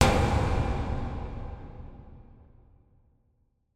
impact metal hit large reverb industrial

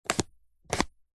binoculars open out or close; foley; zapsplat

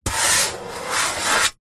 office pen draw long line on metal; zapsplat

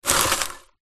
popcorn handful take from metal bowl

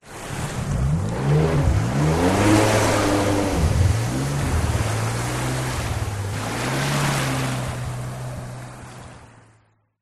Jetski Tkng Off

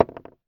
toy small block of plasticine drop on table

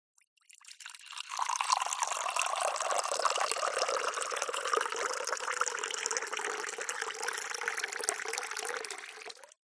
hot water pour mug from kettle coffee tea; food drink; zapsplat

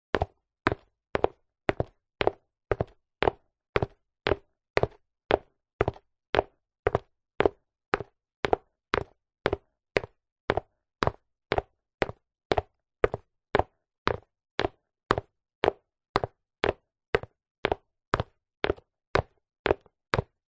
foley footsteps soccer boots walking tiled floor

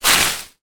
foley dried leaves movement foot scrape; zapsplat

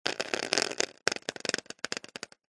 nature water drips sudden short plastic sheet

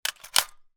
weapon gun ar 15; still north media

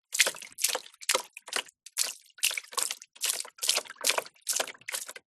household water spill from bucket walking

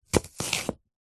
apple chop slice with large knife; food

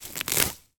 foley grass rip from ground; sound effects one

